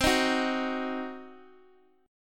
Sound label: C Diminished C, Guitar Half Step Down